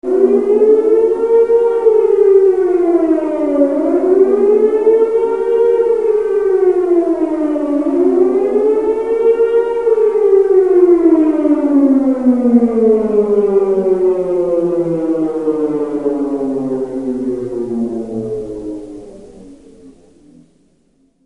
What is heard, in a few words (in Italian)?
sirena; Bombing